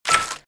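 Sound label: key pickup; weapons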